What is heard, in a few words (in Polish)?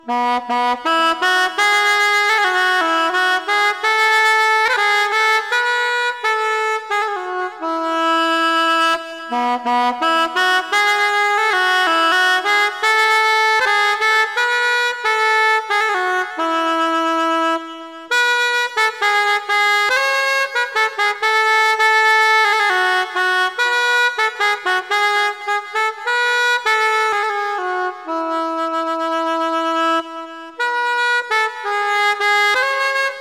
impro sopran sax; Koledy; W zlobie lezy